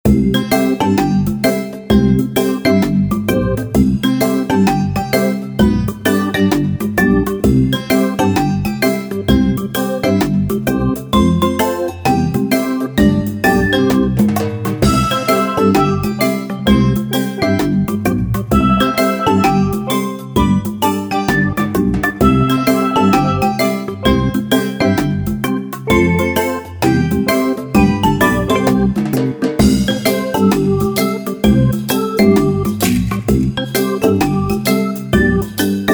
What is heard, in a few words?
repetetive melody